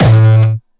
Distortion Kicks Kick A2; Bassdrums; Vengeance; Essential Clubsounds Vol.4; Bonus TonalHS Kick

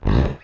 URP, Electric Basses; PlatinumPhattCD2; E-MU Producer Series Vol. 8 – Platinum Phatt

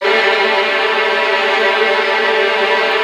VN TRILLS, Virtuoso Strings

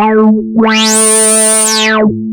OSCAR A3, synthesizer; Hollywood Edge - Giorgio Moroder Rare Synthesizer Collection

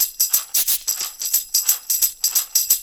Roots Reggae; Sounds Good - Roots Reggae

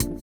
VIBRA; Spectrasonics; Metamorphosis

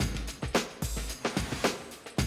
drum loop, 100 BPM

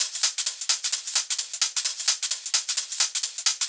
Live Percussion, 130 BPM

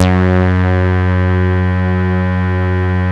CS-15, BASSES

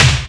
SD HOU, Dance Mega Drums; Best Service

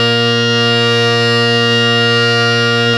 HARMONIUM, World Instruments; Best Service ProSamples vol.52 - World Instruments 2; AKAI

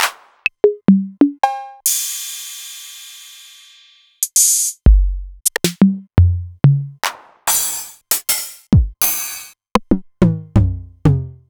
Novation Drumstation; DrumstationV1

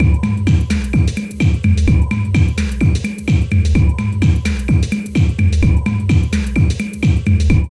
LOOP, Tekno Industrial; East West

